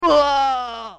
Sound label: hurt; hero2